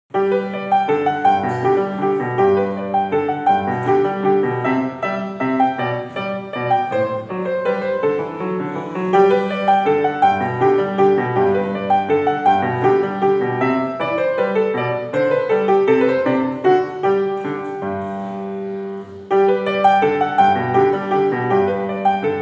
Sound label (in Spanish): minueto en g